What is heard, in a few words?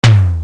LINN TOM, Linn 9000